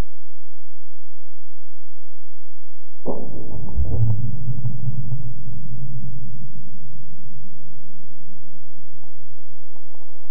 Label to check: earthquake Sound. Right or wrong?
Right